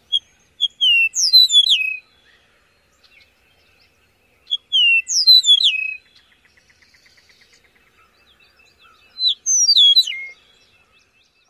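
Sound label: song, Eastern Meadowlark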